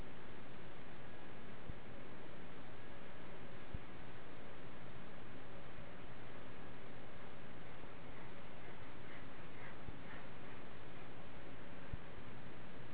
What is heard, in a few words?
someone sawing